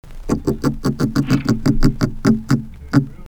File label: Oinking pigfish; soniferous